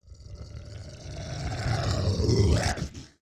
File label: Slithering Waste, Vocals Monster; Sony Pictures Sound Effects Series